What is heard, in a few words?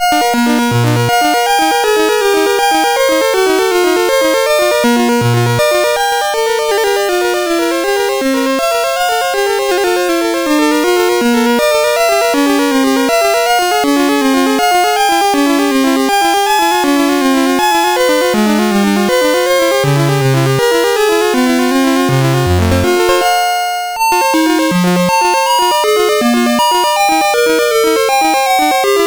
Partita Gigue, CSG8580R5